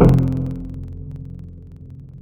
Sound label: Tumbi; wavetables; Synthetic's Serum Collection; Standalone-Music SYMPHONY Wavetables